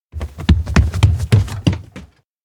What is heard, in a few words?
steps indoor tatami run; anemoi パッケージ版 1.1